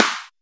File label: Claps & Snares